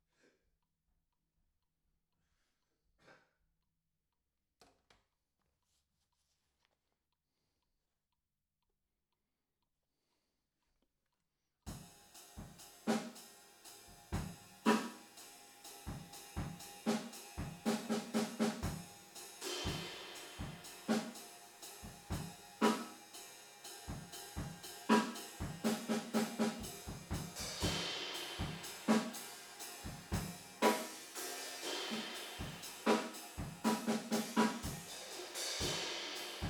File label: TB GTR